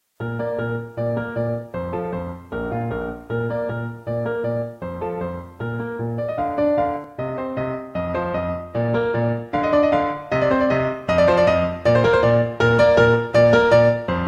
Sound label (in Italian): Due Esercizi Per Pianoforte; Beethoven; Hess058